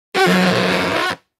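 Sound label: squak rubber, funny cartoon sounds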